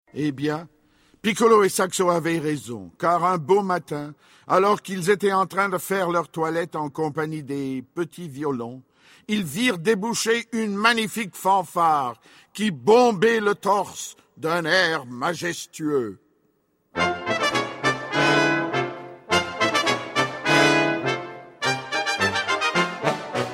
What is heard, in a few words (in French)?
piccolo saxo cuivres